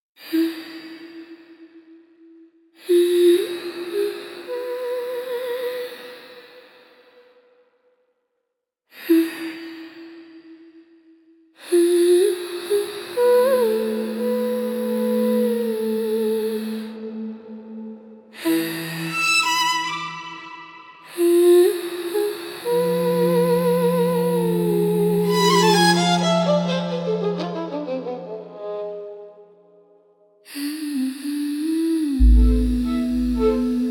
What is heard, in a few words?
spiritual spooky soundscapes